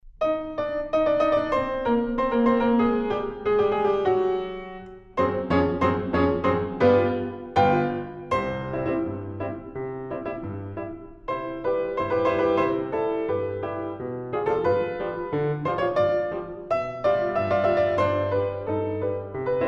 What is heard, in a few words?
piano, Fox trot, Chanson; Obras para piano; Pedro Camin; Mistinguett